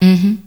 mmm hmm, voice

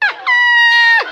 "ah ah", female; Voice; ooh ahh

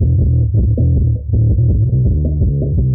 bass honky tonk, Loops Phonky

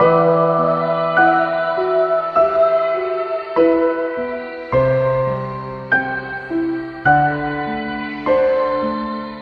piano, sad

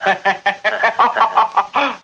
laugh mocking; Voice; ooh ahh

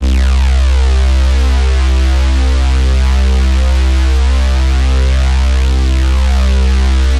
buzzapper, Synths; Notes

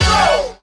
go chanted

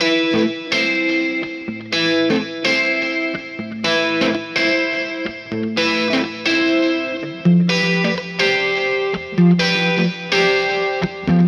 guitar

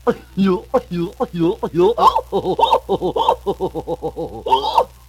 laugh hyuck; ooh ahh

